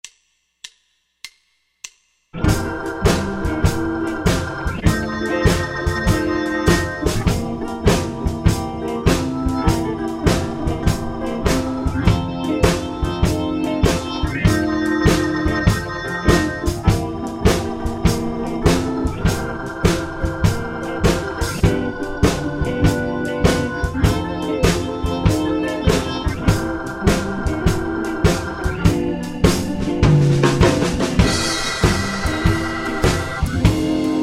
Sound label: Beginner Blues Turnaround in C